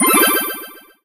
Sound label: Pickup-Heart